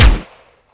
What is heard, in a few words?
fire; Quake 4 Alpha